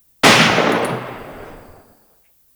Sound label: Grineer Lever Action Rifle Tail